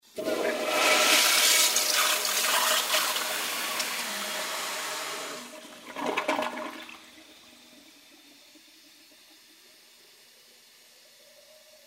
sliv unitaza i napolnenie vodoj

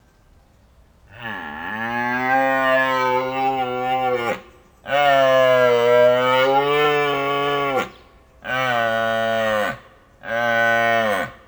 zvuk reva blagorodnogo olenja

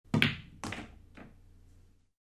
udar v biljarde

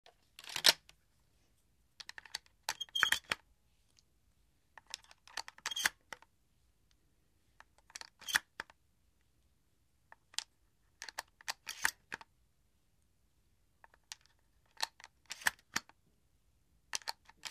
zarjadka patronov ruzhja

